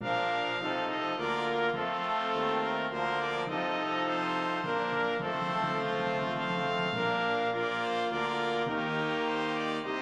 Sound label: Brass